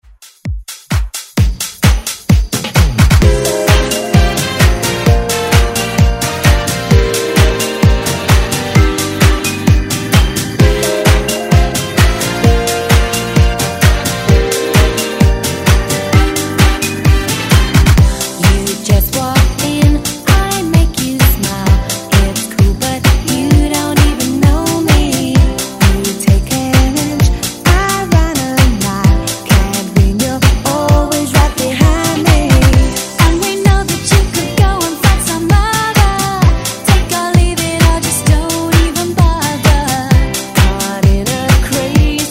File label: Stop House Redrum